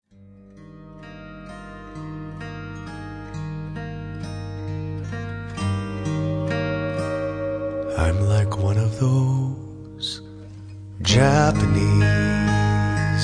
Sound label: Japanese Bowl